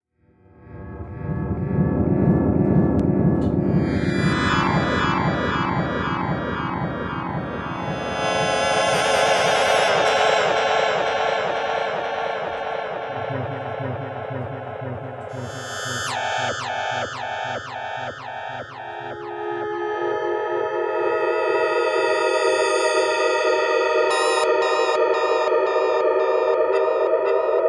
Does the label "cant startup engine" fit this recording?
No